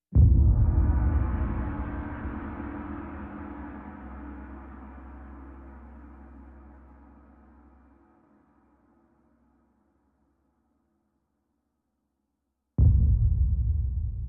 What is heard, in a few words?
Percussion Only